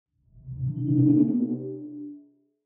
sci-fi display transmission damped

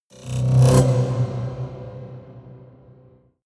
haunted strings roll

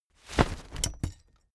umbrella big open full hard

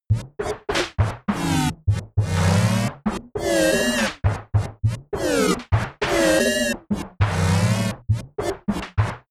sequence crazy noise percussion lead

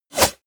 wipe

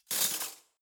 cutlery spoons drawer place hard

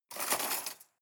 cutlery cake forks drawer grab hard

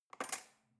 cutlery knife drawer handle rattle

